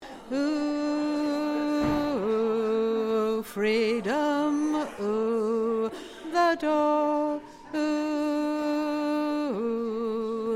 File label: bass